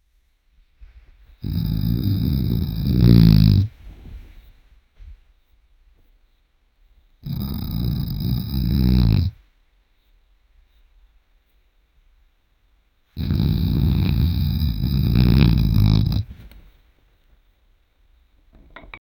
sound of snoring grinding; sleep

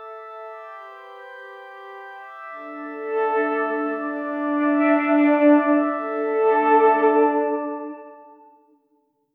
soft ambient intro with a; world

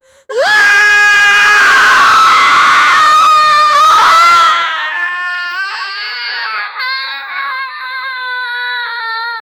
crying inhuman screaming; horror game

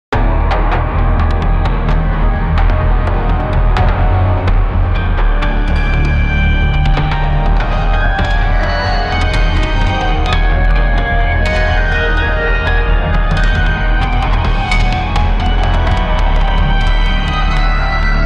movie trailer

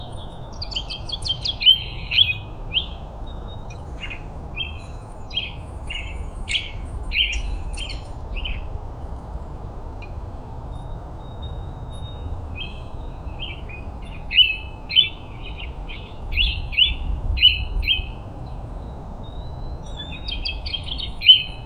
peaceful american park

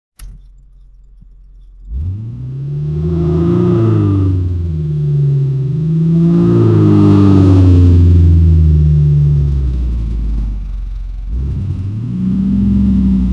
old car realistic engine; automotive

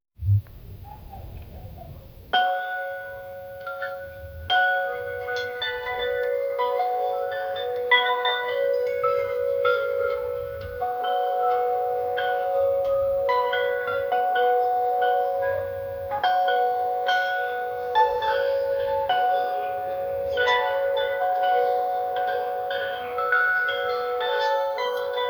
door opens with little, bells

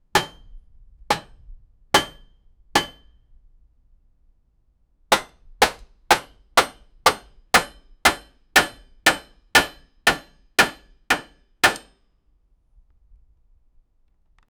a hammer hitting the iron, blacksmithing

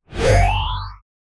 sci-fi sound representing, notification

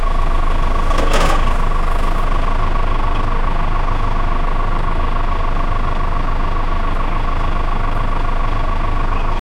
bus engine hum happy chat, birds chirping

